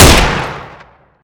tavor; garrysmod

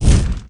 flamer; garrysmod

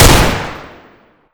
ultimax; garrysmod

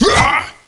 swing; garrysmod

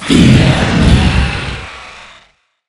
banshee taunt; garrysmod

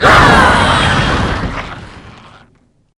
banshee death; garrysmod

